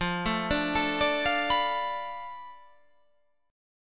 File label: F 6th Flat 5th F down, chord